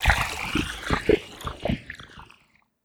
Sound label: Hydra blood liquid